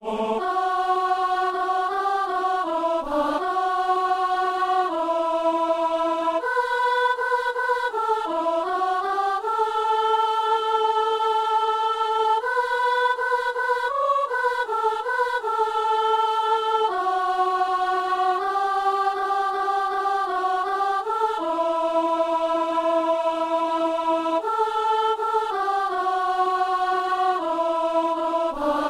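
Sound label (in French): marie témoin d une espérance soprano; Saint-Denis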